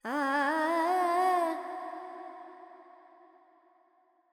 FX, Vocals, Carnatic Female, Alaps, Charukesi

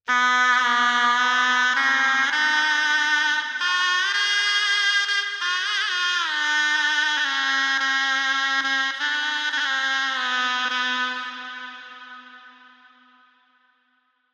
3-4 Bm 100 Fx, Melody, Shenai; Sample Banks

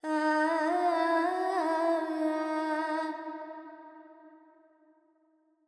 Verb Charukesi, Carnatic Female Alaps; Sample Banks

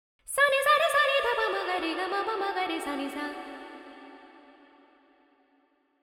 FX 4-4 D 100, Vocals Female; Sample Banks

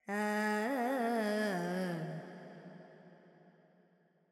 Pentatonic, Vocals, Carnatic Female, Alaps, G; Sample Banks

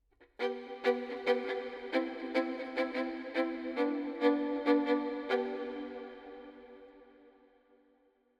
violin FX 4-4 GM 70; Sample Banks

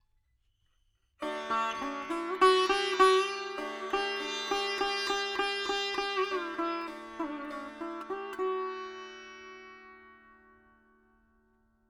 4 4 D 100 Dry, Melody Veena; Sample Banks

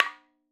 madalam notes R

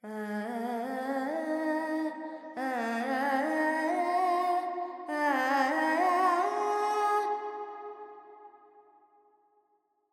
Pentatonic, Vocals Carnatic Female Alaps 70 G

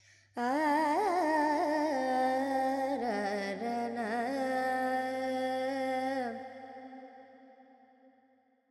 FX Lydian, Vocals, Carnatic Female, Alaps

